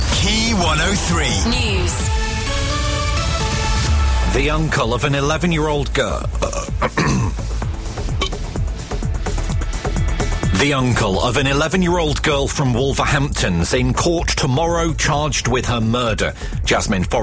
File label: cough; Key News